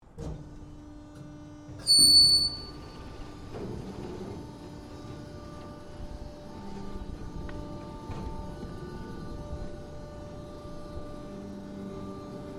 Trash Compactor Operating External, Industrial trash compactor; Machinery; Machines